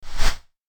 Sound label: Short Swoosh